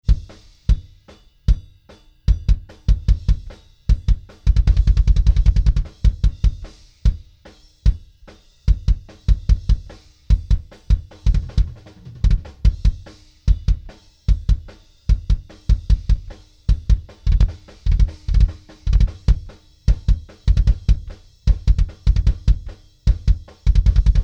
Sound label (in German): Tube Tech PE1C BD Mikro aussen roh